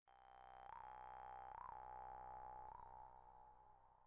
Vargan

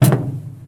footsteps metal walk

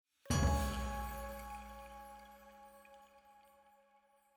Jingle Bellchime Perc C sharp

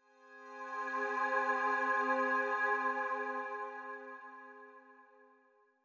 Calm Pad; super mario maker 2; Peaceful SFX Raws - Otoasobi

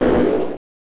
Explosion; Bioworm; SNES

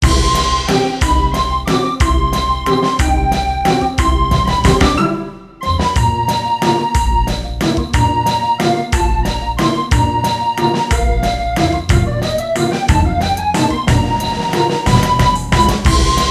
Select BGM, Waltz; puzzle bobble 3 saturn

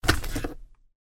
Foley Pick up torch; The 39 Steps

